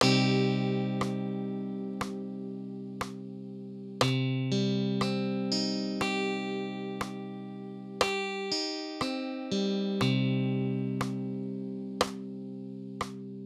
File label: Cm rhythm